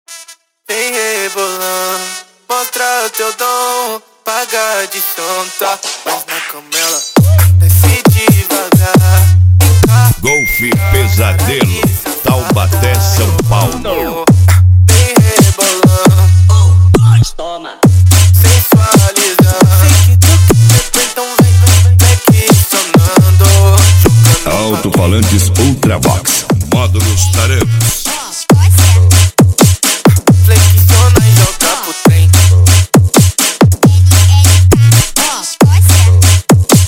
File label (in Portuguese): funk frequencia; taubate sp